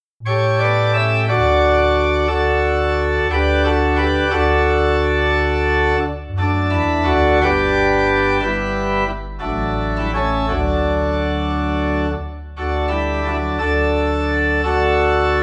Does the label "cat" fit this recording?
No